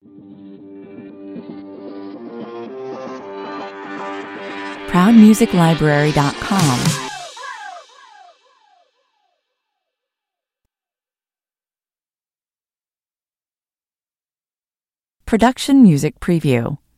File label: stinger